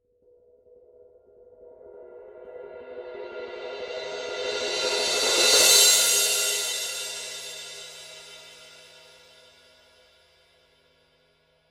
full crash roll, Paiste 17" Signature Full Crash Cymbal